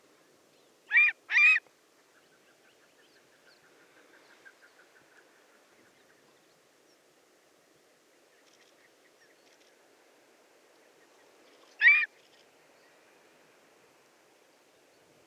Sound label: buteo hemilasius, rough-legged buzzard; oonosuri